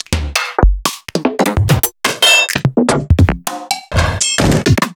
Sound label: Percussions; Sliced